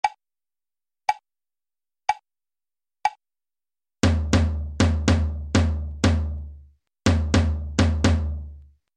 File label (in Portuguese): imitacao ritmica